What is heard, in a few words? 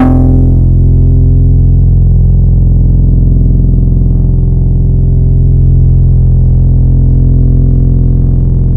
Dirty O, Serum Wavetables; Synthetic's Serum Collection; Cymatics - Nuclear for Xfer Serum Wavetables